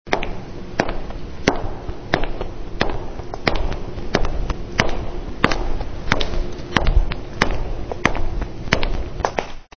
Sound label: steps